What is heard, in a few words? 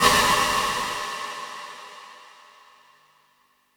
RIM PLATE, reverb; quadraverb; wet reverb